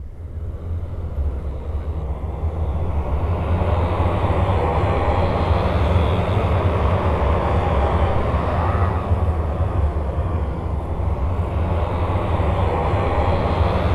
GUTTER SPIN, gutteral, voices

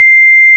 ORG, Farfisa

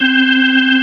B3 C 4; B3 LES SM

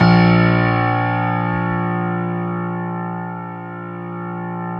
StereoGrand; E-MU Producer Series Vol. 2 – More Studio Essentials

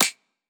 clap, hip-hop drum kit

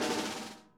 SNARE, Interactive Drum Kits

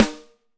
4X14, Drums; Big Fish Audio; Ross Garfield Drums VOL-2; TOPEKA KIT

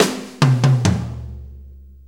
6-8 Fill, Drum Tools

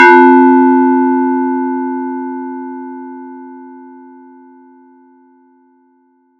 FX RND BEL; ModelCycles

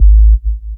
DR. LOOP, BASS; X-Static Goldmine 3

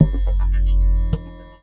JUG HYBRID, PERCUSSIVE

